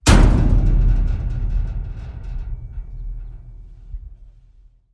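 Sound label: Hit Organic Trash Can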